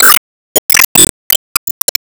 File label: Serve Ball Build